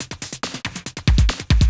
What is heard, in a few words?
TECHNO BEATS 140